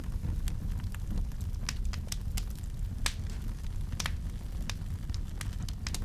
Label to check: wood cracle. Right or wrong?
Right